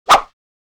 swish single; Asian Show Sound Effects

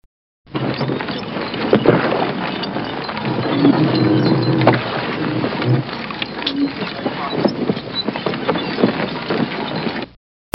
boat

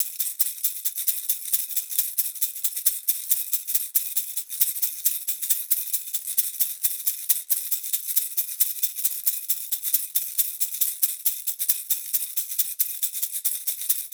Silver shaker; Warrior (Tohoqashaw)

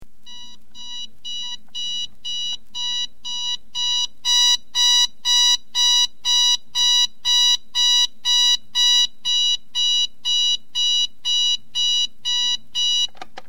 alarm clock

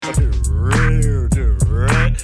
humpty slide

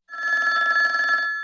ring

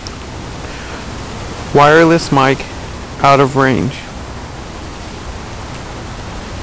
Fading Mic; Fading with Milli Mic

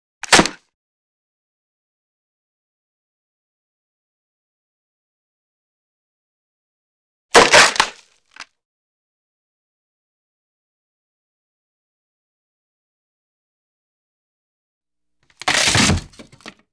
wood med snap; Asian Show Sound Effects

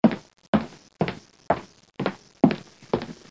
quick steps

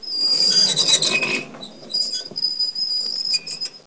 squeaky wheel

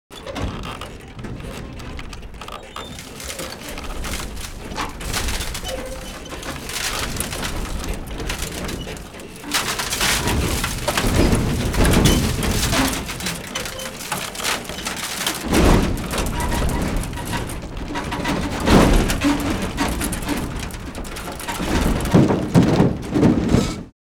metal stress creak, foley effects; Asian Show Sound Effects

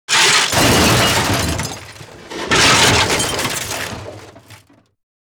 impacts large, foley effects; Asian Show Sound Effects

